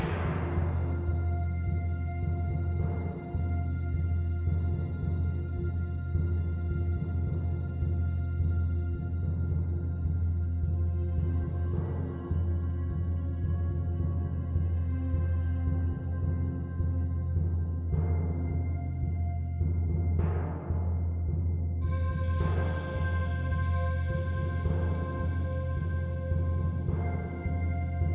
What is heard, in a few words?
Enemy Fight Stem; Icy Plains